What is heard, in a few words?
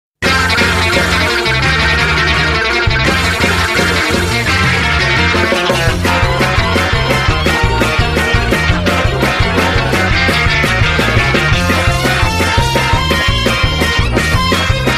Tremolo Tom Balkanic Rock